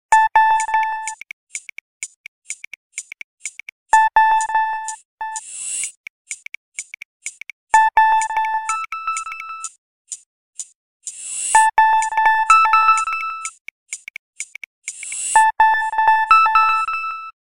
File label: Elevator, Nokia Tones; Windows Phone apps